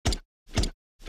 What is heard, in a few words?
Walk